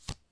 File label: slide; AfterStory; Doki Doki Literature Club